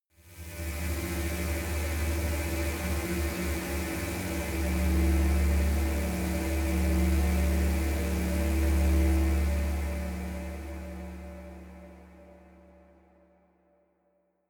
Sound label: spaceship core; raumschiff